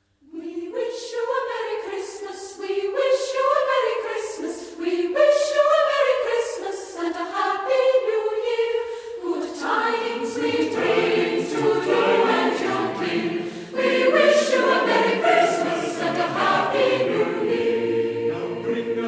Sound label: A Merry Christmas, Choir; Brisbane Concert Choir